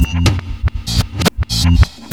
DRUM FILL

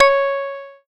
C#, Synclav FM PLUCKED; Big Fish Audio

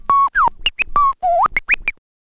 scratch kit, vinyl

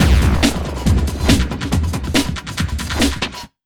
140, Big Beat Drumloops

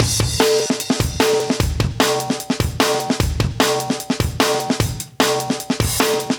150 live breaks

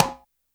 bongo; Roland R-70; Perc

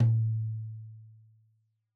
Tom2 Stereo, Deeply Sampled Drums

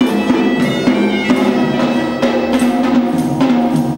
MIX, India; Best Service; Ethnic Journey Sound Cube

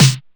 Snare, DIGITAL KIT

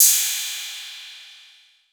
808, CYMBAL; Wizoo - Platinum 24 Electronic

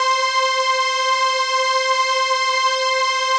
PAD, Dance Mega Synth

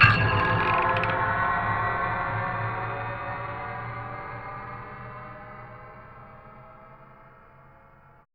BEAM ME UP, Drums; Drums In Your FACE!

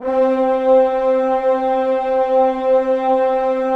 PAD, DIGI-PAD

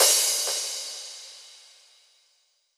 Loop 128BPM Crash; VEC5 Drumloops; VEC5 Multi Loops 128BPM; Loop Elements

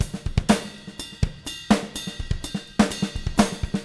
124, Living Drums

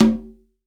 CONGA, STEREO CONGA

